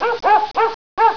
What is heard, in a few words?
basset, barking dog